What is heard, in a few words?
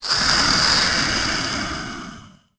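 scream; The Elder Scrolls III Morrowind GOTY; XBOX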